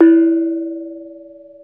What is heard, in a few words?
BONANG, Bronze Gamelan